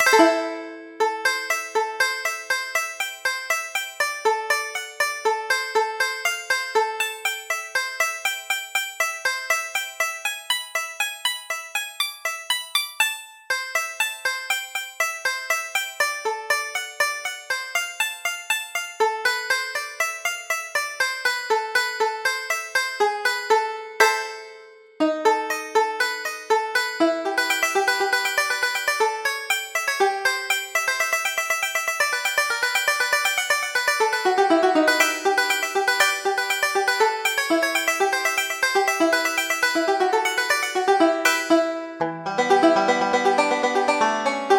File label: New Orleans Blues, MIDI; Banjo; Umi Abenaki Cantares RP Red Dead